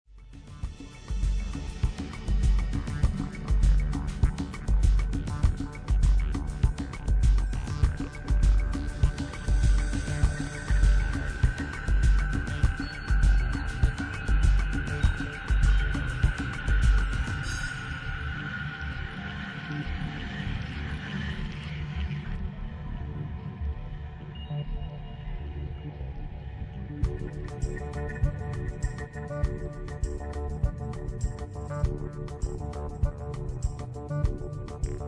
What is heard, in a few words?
Easy Listening